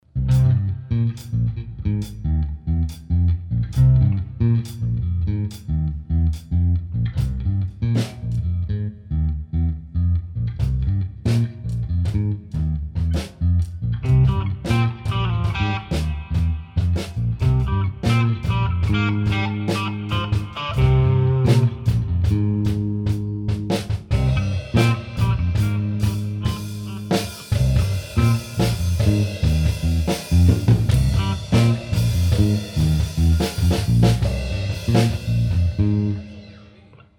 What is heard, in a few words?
Jam; live gigs